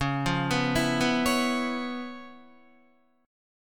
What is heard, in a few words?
C sharp 7th Sharp 9th Guitar, chord; arpeggiated; C#7#9; JGuitar